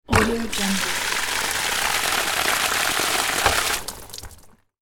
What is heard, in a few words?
big pipe valve leak; نشتی لوله بزرگ; industrial sounds